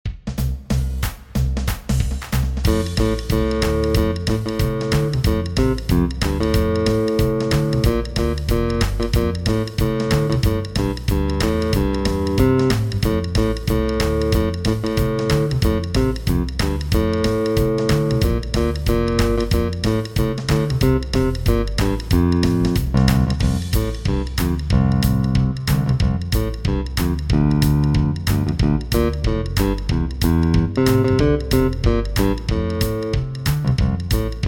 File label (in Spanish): Jingle Bells Jazz Bajo Eléctrico Punteo en Fa Mayor F